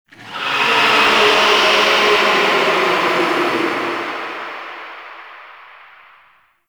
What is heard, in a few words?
Pain Verb; Enemies; Warframe; Tau; Sentient Dactolyst